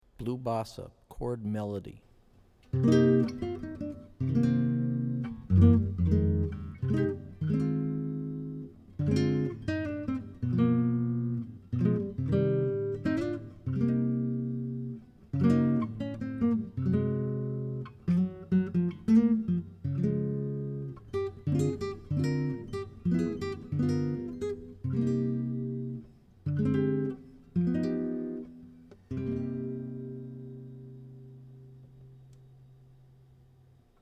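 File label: Chord Melody, guitar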